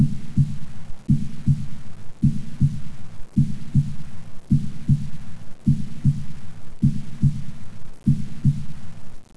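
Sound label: HEARTBEAT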